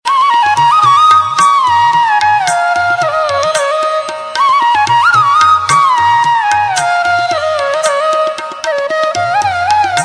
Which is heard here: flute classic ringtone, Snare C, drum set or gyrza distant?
flute classic ringtone